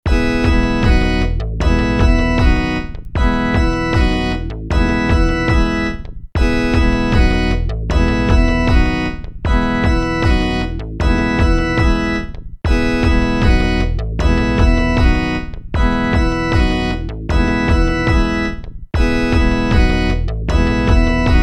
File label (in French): bruit rose